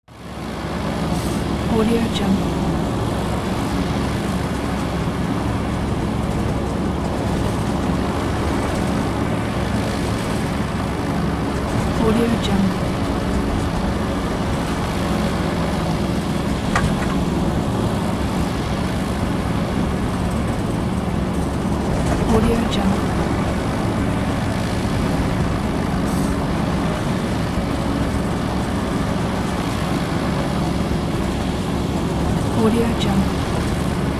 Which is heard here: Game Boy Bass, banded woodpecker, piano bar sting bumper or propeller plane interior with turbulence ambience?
propeller plane interior with turbulence ambience